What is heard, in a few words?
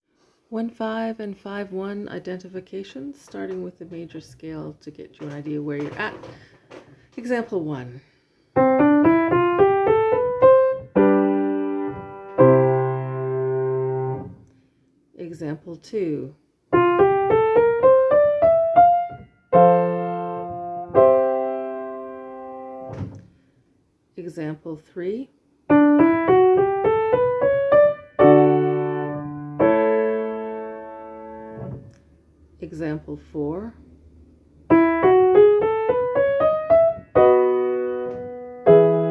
V-I and I-V Identification; Interval Identification